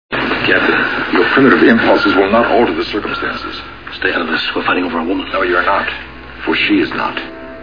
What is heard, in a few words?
fighting